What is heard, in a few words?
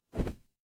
paper fabric cloth whip